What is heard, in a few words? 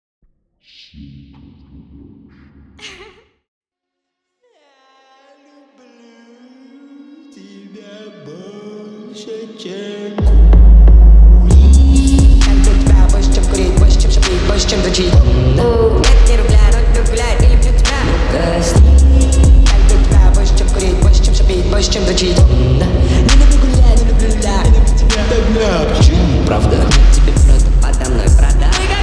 26-29hz Low Bass